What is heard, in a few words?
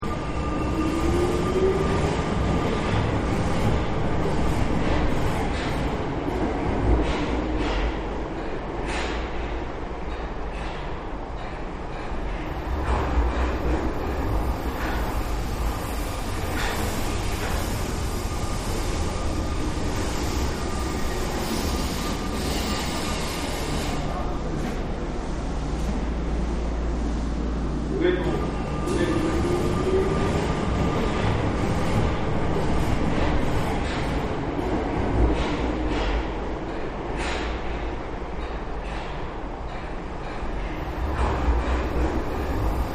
Tokyo Quiet Train; Trains; Vehicles; Tokyo Quiet Train 2